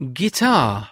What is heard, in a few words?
guitar